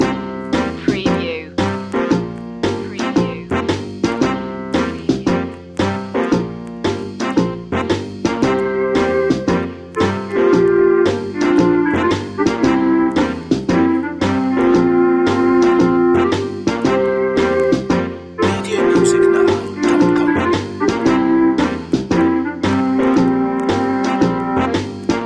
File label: retro theme loop